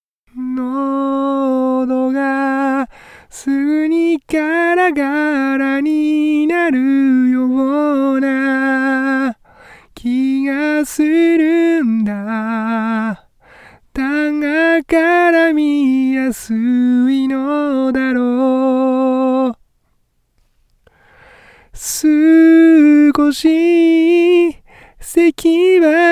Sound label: vocal